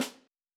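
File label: snare MF; tryThis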